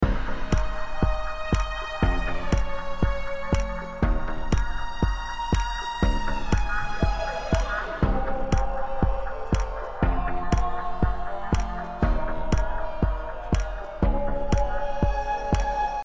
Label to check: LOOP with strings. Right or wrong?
Right